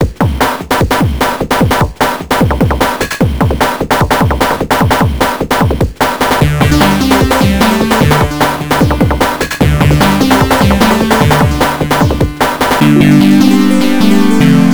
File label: Pause Menu; Striker; Genesis